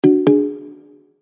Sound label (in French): BIP progression